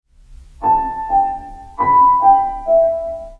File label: Piano Determ